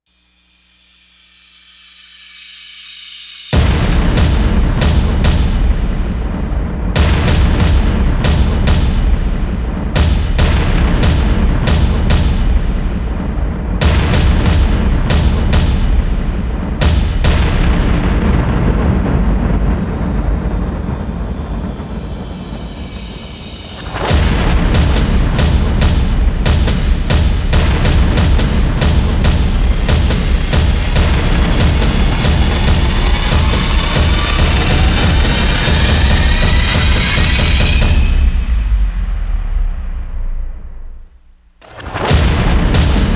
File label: percussion only